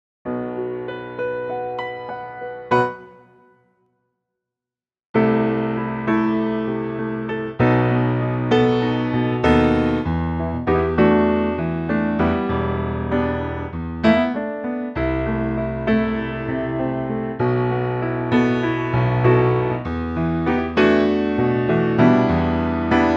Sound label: Em Piano; key - Em - vocal range - E to B (optional E falsetto!)